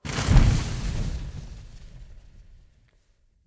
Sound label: Blaze Armour Loop End; Powers; Fenn